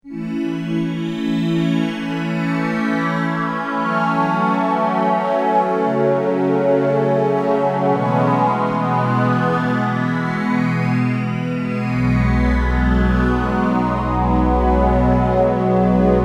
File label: PAD Sync Phaseed